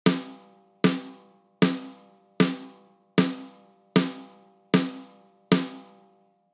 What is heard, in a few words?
Distortion Snare Off; MannyM